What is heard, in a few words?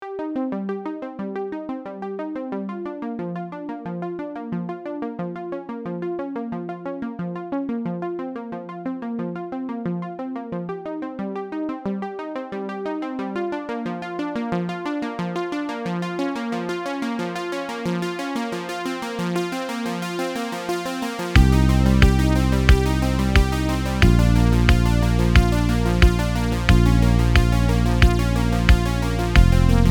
Analog Waves